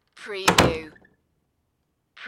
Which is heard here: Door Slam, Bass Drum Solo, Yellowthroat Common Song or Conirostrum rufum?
Door Slam